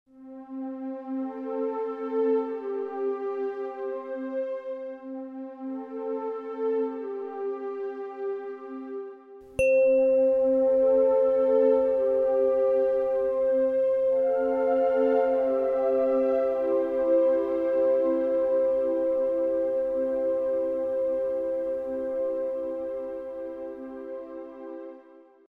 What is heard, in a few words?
528 Hz; Wunder, Gesten, DNA-Reparatur; Solfeggio-Skala